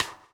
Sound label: DOWN, DOWN KIT 78